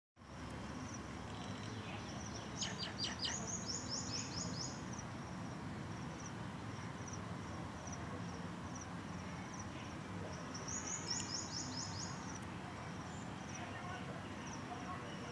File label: Honey bee